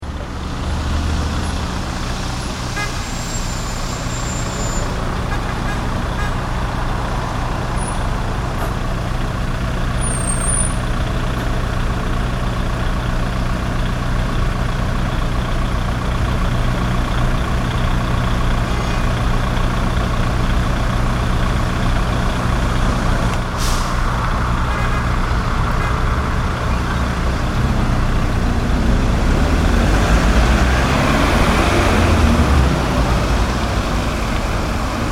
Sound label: Urban traffic at rush hour; Busy Traffic; Traffic and Cars (Sound Effects:Backgrounds)